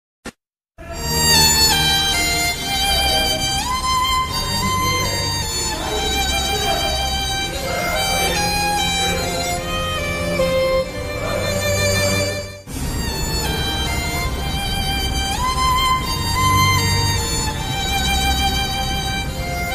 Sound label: sad violin bgm; anniyan